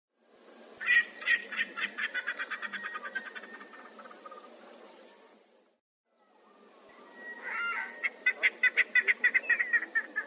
Erckel's spurfowl

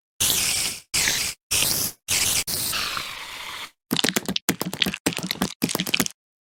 spider; Minecraft; ماینکرافت

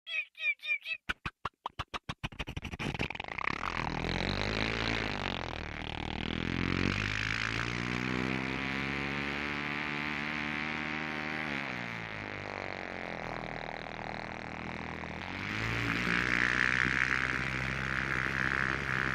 Cartoon Airplane

